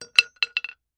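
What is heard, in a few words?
Hammer Dropping Metal; hammers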